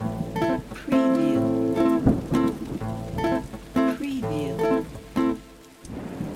Guitar Nylon Pickup 6-8 Am 85bpm, persian; beatcraftpro; Yaldaei

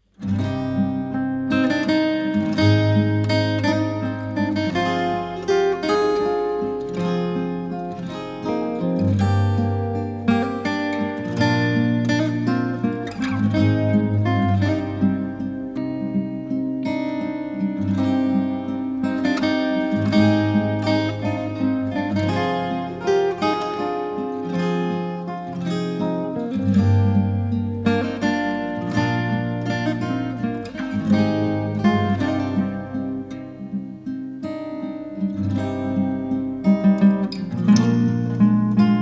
Guitar Play

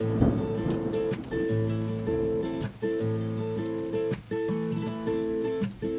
rumba nylon guitar

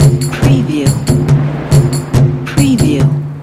damam, muharram; muharram loop collection

